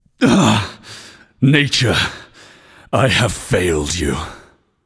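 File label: non verbal boohoo, voice; cernunnos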